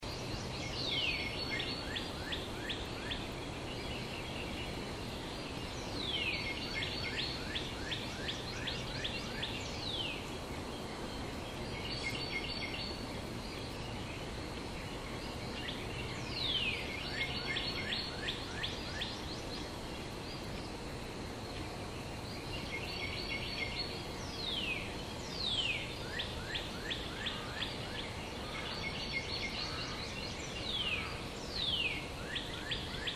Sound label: perched; archipelago; town